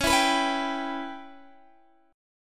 Db Minor 7th Flat 5th Db down Guitar DADGAD

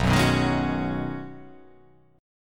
B 6th Add 9th B down, chord

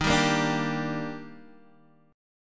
Eb Major 7th, Guitar, Half Step Down; EbM7

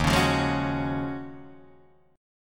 Eb Minor 11th Eb down Guitar Drop D; Ebm11 strummed; JGuitar

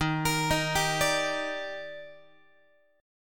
D sharp Major 7th, Guitar; arpeggiated; JGuitar

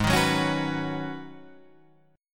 Ab Minor 11th, Ab; Abm11 strummed; JGuitar

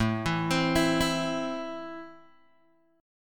A Minor A down Guitar Open G

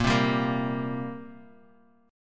G Minor Double Flat 5th A sharp chord

